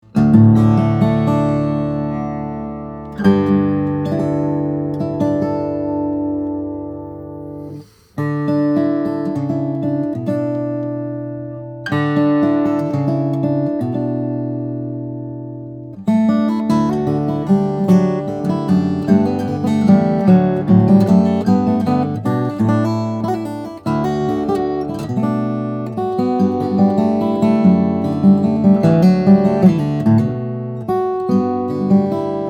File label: steel string flattop guitar; applegate; madagascar rosewood; european moonspruce